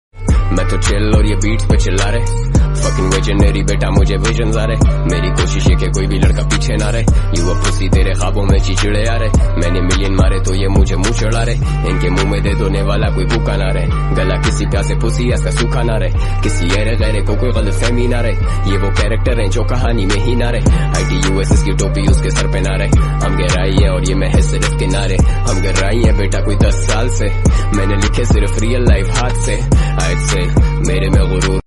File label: rap, english